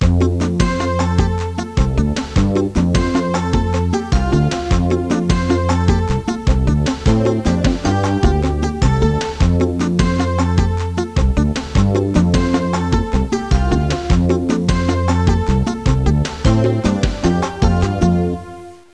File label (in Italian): suonerie contemporanea; musica contemporary